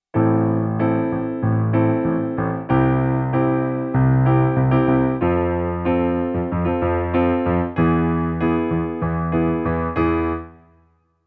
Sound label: Rhythm example